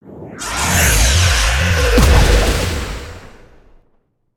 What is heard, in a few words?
Spin; Skullken Inventor; creatures organic